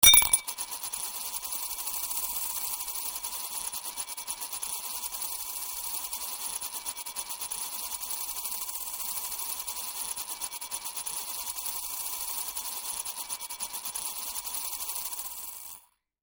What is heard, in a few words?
Long Loop Org